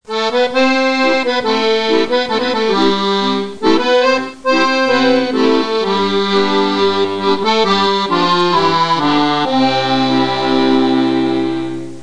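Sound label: accordion; אקורדיון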